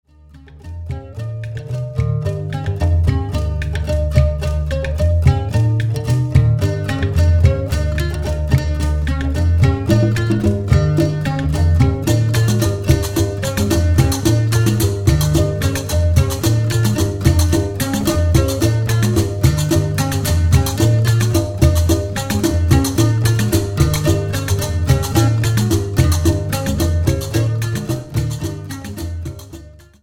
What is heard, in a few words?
guembri blues